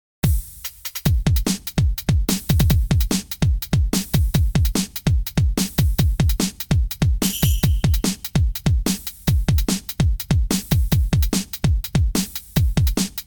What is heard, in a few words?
drum